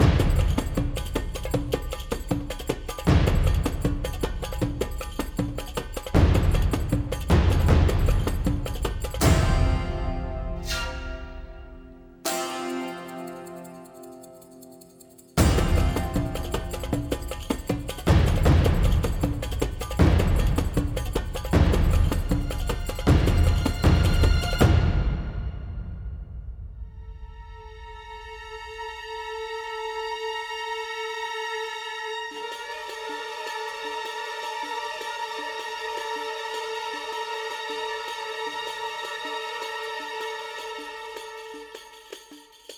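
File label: Percussion loop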